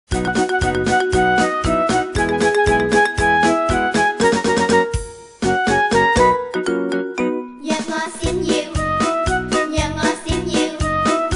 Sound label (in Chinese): 粵語兒歌, Cantonese Children Song; 歌詞版